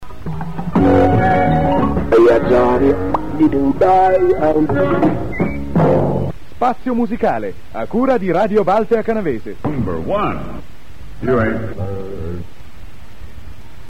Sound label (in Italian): stacco